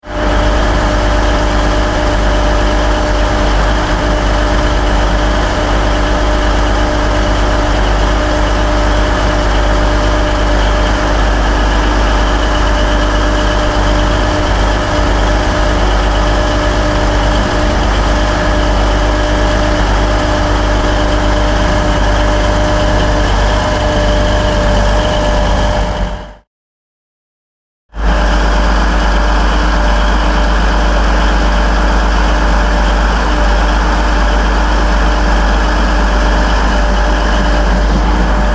Train Engine, 2 x sounds of stationary diesel train engines.; Trains; Vehicles